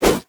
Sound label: BOSS Atk